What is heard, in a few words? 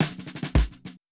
70 s Fill 108 bpm, drums; Basement drums vol 1